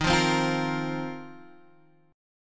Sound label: A sharp Suspended 2nd Suspended 4th D sharp